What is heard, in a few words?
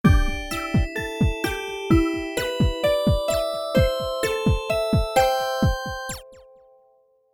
Bumper Beat; Undersource OST